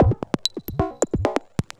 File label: Electro Perc, Dance Beats